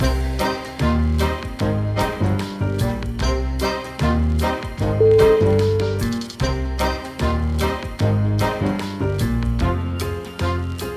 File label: jazz club